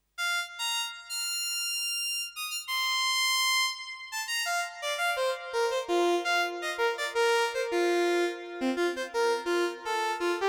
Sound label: Strymon Big Sky Hall Strings